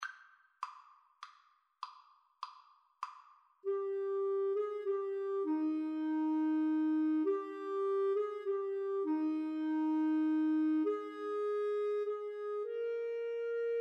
silent night, Flute Clarinet Duet

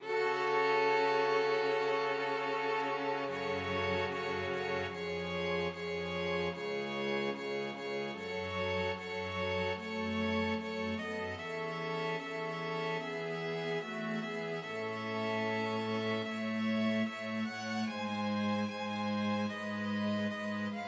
string quartet; beethoven 7 2nd

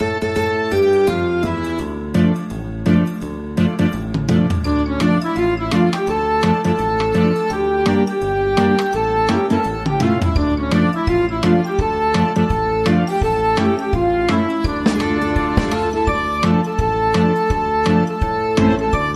BAND, mixed ensemble

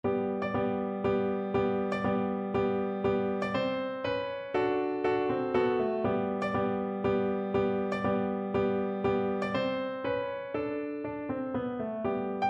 autumn, piano

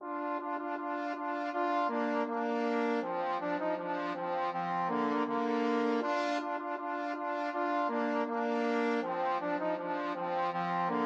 trombone duet

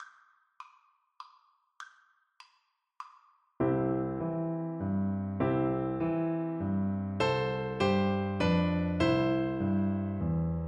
waltz, flute

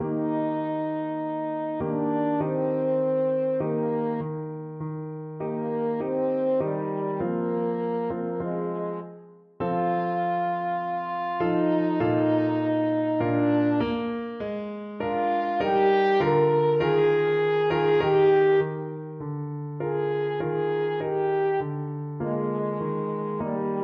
locus iste, french horn

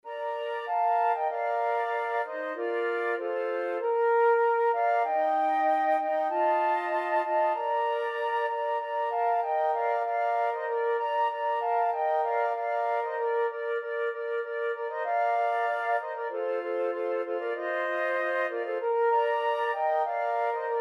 joy to the world, flute trio

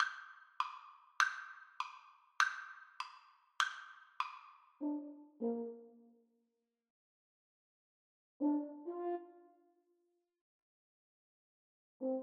skip to my lou, French Horn Duet

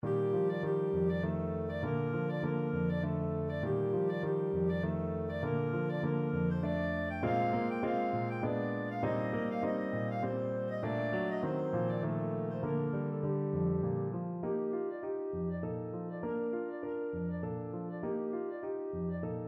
maniere borodine, clarinet

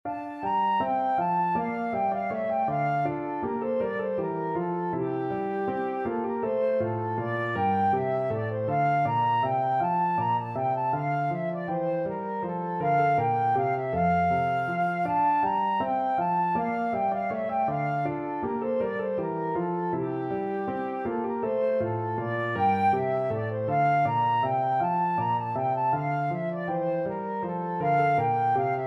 bourree, flute

